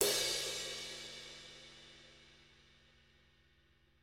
drums crash; brut

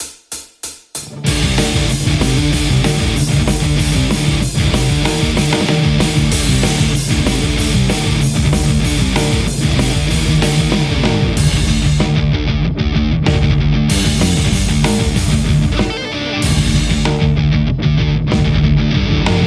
metal les paul; ik; thunderverb200

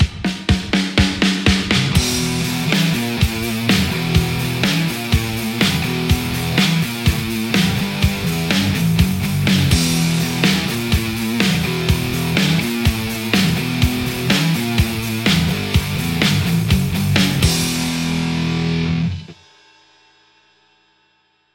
les paul; rcvprod amplistortion

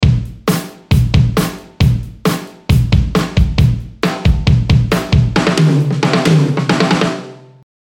drum pattern

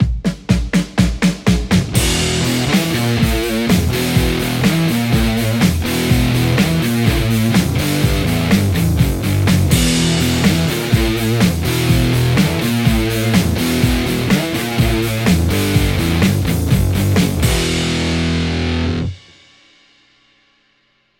Les Paul; poulin hybrit

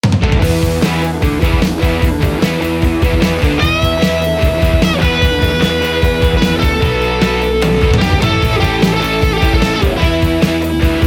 crunch vintage; aradaz

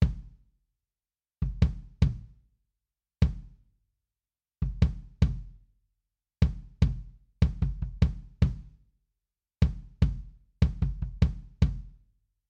drums kick

